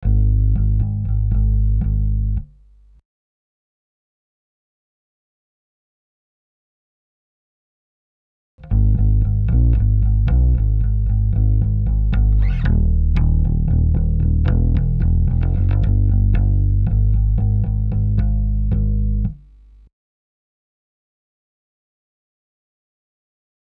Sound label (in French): basse